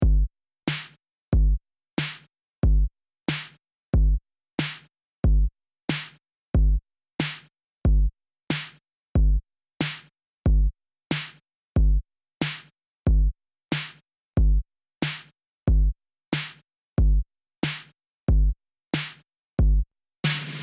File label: BEAT